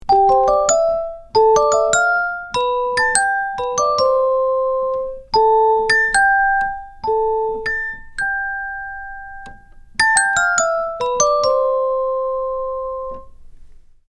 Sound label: ringtone